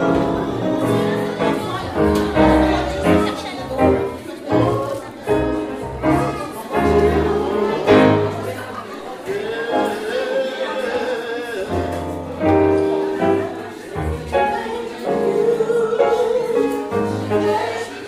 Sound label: Thank you soprano